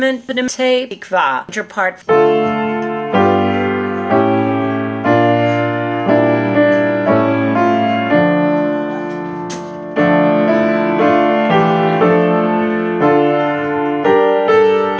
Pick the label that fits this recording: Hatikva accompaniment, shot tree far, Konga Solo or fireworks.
Hatikva accompaniment